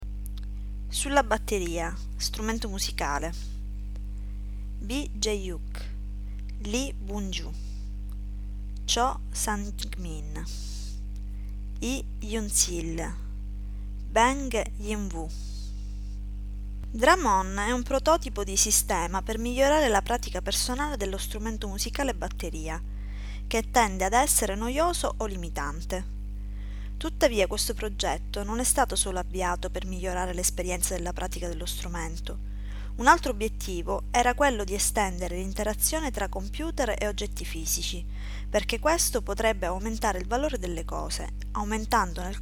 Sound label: drum; italian